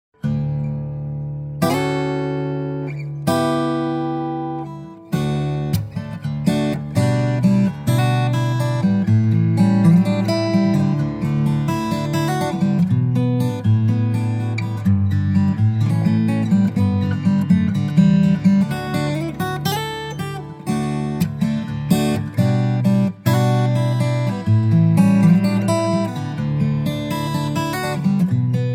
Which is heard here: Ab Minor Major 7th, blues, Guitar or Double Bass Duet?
Guitar